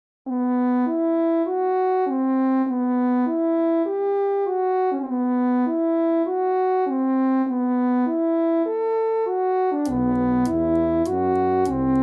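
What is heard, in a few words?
sea monsters brass french horn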